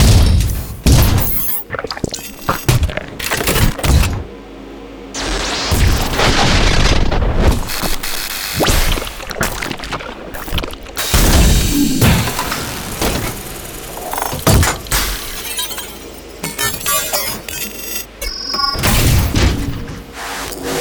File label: Exosuit Hybrid Exoskeleton Sound Effects; Bluezone